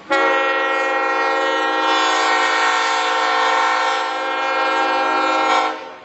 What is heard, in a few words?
horn